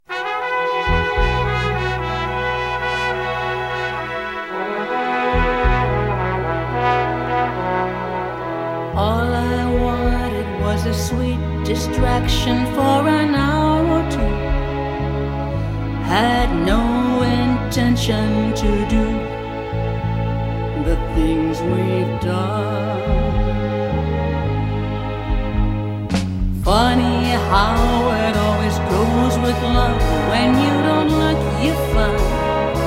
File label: jazz orchestra